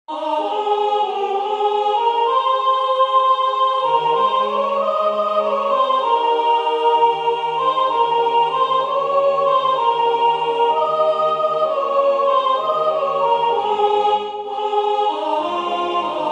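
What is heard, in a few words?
From Starry Skies Thou Comest soprano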